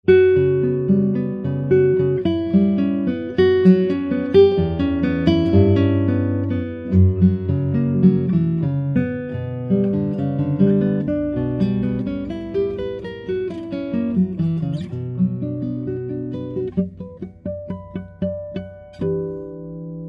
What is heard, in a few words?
Spruce, guitars; Barrios; La Catedral